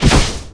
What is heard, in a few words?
hero die